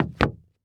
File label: Foley Footstep Hard Sole Dress Shoe Single Step Up On Solid Wood Platform; Foley Footsteps